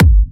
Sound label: Electro Kicks